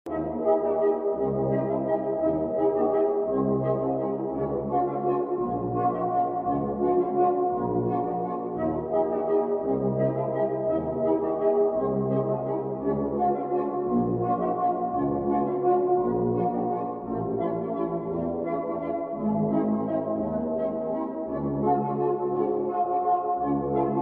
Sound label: Carousel; oblitus casa